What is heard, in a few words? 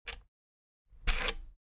rotary control plastic rattle turn